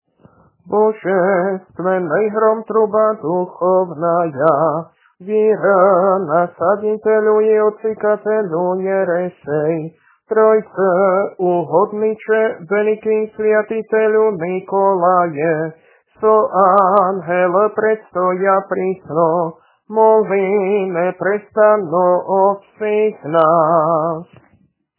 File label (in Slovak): Kondák svätiteľovi, hlas 2; nedelna-skola